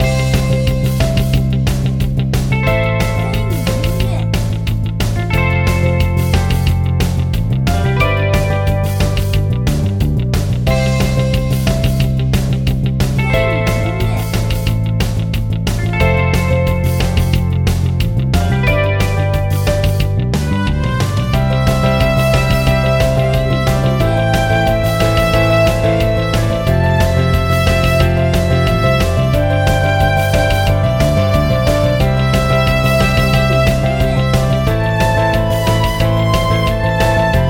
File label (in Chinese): 换装音乐